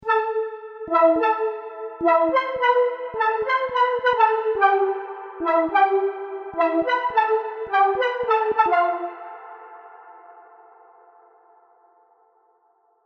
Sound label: serum synths; chiqui audio